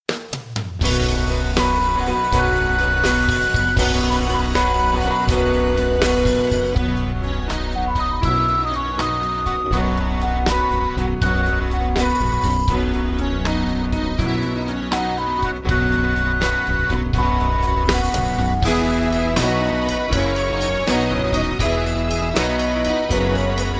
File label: ahoy there karaoke